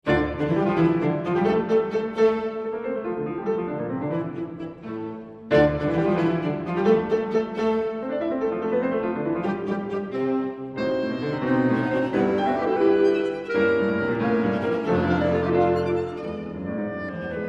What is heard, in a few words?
Quintet; Fibich